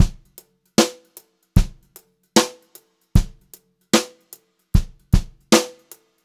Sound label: brushed drum loops; USB Soundscan vol.39; AKAI; 76 HOTROD